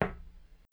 clack; abstract; Free Sound Assets

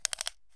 Measuring Tape roll up

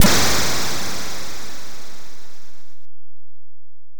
Noise Digital Fade; FREE SFX PACK VOL 3

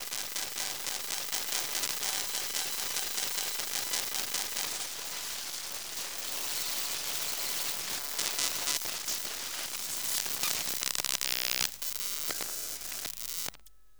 Electromagnetic Computer Sequence; Mono; Essentials Series NOX SOUND

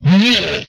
Hit, Vocal; MMRetroArcadeSoundsPack

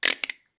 bug, creature

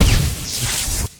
electrobolt strong; weapons

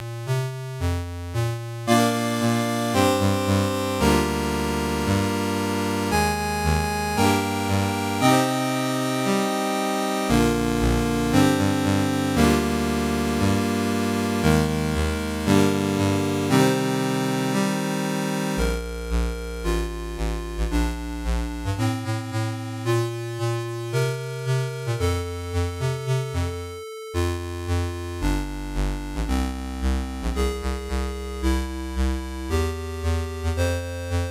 Retro Music, ChipWave; ABMU

